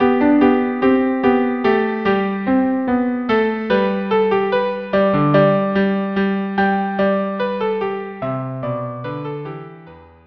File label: march vla vcl VIOLA